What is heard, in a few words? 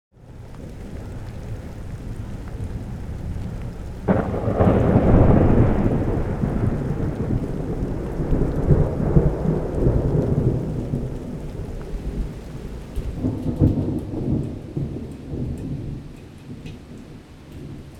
rainstorm; Lake of Sorrows